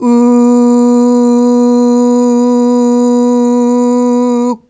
Ooh Long B2 Dry, Harmonic Building Blocks

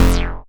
Bass, Synths, 5U Modular

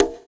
Conga; Equipped Music; Loungin House

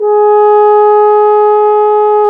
brass, F.Horn Solo; French Horn; F.Horn 4 Ln

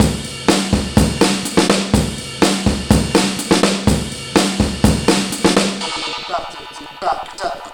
DRM 124, Breakbeat; Best Service ProSamples vol.24; AKAI

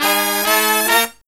Killer Brass Riffs, 108 soul

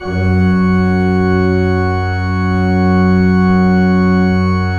PIPE, 3DOrgans

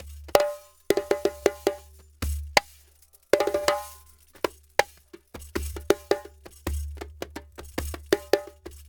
Djembe with Kessing, African

